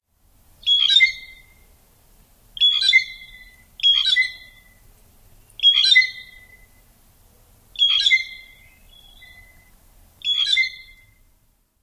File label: ringing squeaks; NY